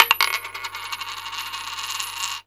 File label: COIN DROPPED; AMG - Vince Clarke Lucky Bastard